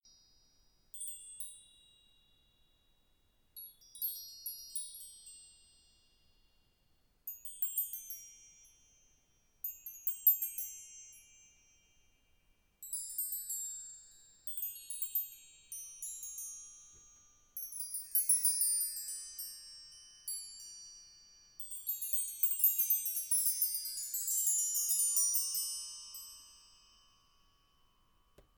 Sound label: Chimes